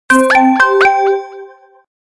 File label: Chip Appears Jingle; MOD Chip; Metroid Prime Federation Force